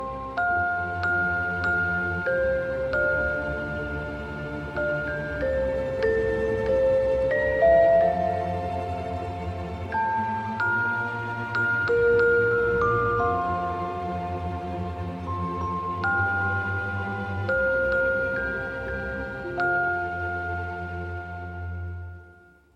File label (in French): Musique